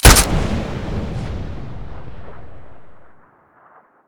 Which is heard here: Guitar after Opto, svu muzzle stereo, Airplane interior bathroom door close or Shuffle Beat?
svu muzzle stereo